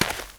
dirt, footsteps

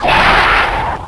pain

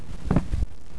hit ground

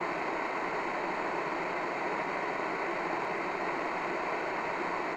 radio static

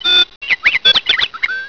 bird

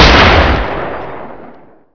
sten shoot

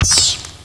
rat die

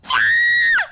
koro scream; korone

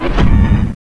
stomp; endboss

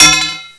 machete metal

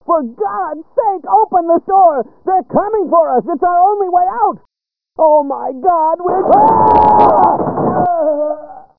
door

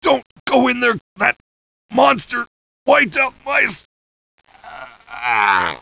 dying; otis

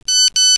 mobile sms

